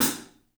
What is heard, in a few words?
3D Drums, TIGHT ZILDJ; 3D Sound